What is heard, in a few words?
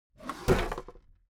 foley office desk drawer open heavy; smartsoundfx